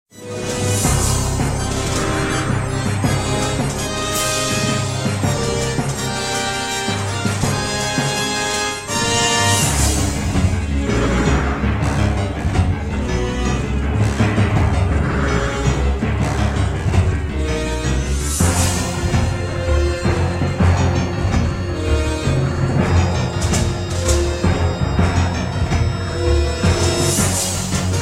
Final Battle Theme

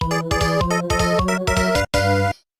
Hurry Up!; NSMB2

